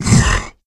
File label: become alert, infected male